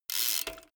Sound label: bmx bike crank freewheel fast short